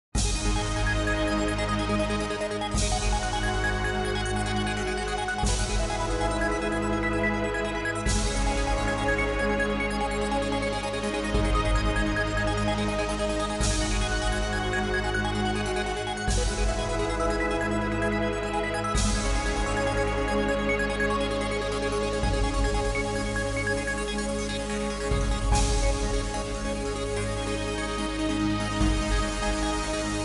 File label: Dramatic One